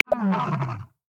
Lion

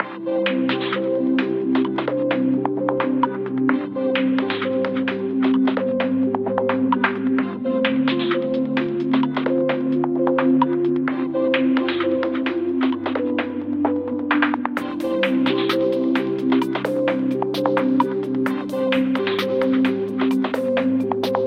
Soft Beat; Samsung Z2; Ringtones

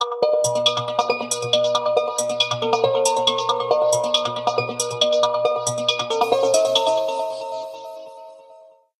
metro, alarms; Sony Xperia Z2

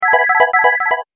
Serene tone; Samsung; SGH-F700

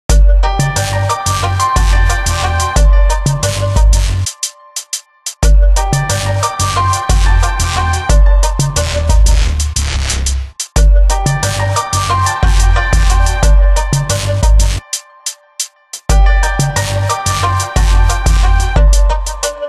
Ring Solaris; HTC Touch Pro2

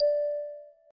Class Electronic, notifications

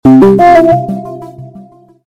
Message, phonetones; KS10

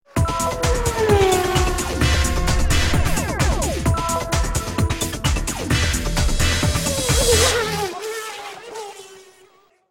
3D Sound; Samsung; SPH-A700

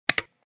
Double click, Notifications; Alcatel Vodafone Smart II

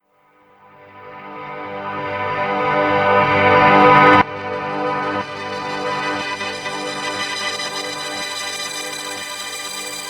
ringer digital ascent; ZTE Open-C

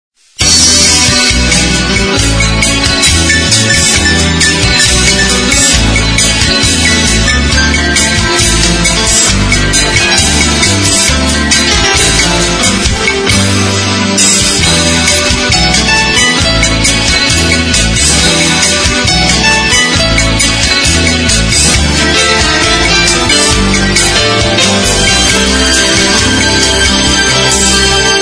Ringtone

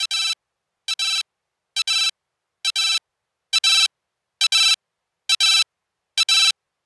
high pitch, Retro Riffs, alarms; Google; Google Sounds

